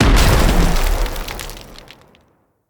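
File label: Siege Engine Cannon Projectile Explosion; Weapons; Enemy; Artillery; Ode Siege Engine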